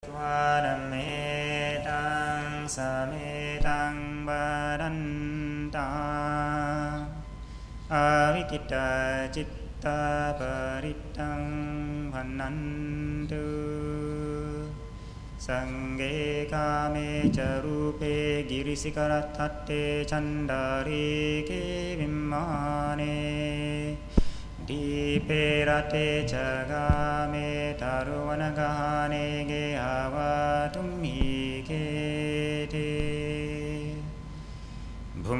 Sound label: Paritta Chanting; Kuala Lumpur